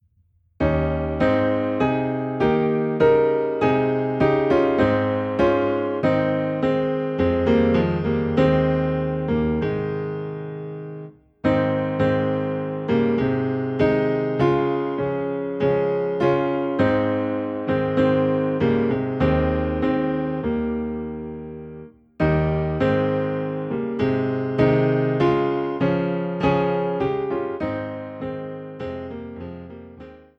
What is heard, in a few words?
Piano Low in A Flat; He Leadeth Me